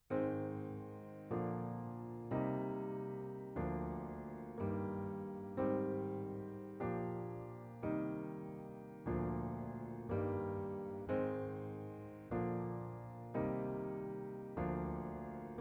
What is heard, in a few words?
Piano B